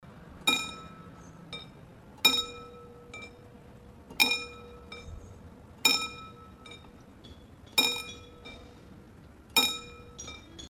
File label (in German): Klingeln einer Bahnschranke